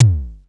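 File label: bass drum, Tama TS-305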